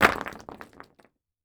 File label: Crashes Rock Debris; AdobeCC